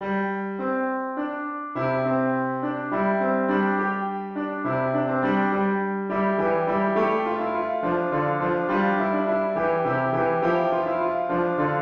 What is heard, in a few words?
piano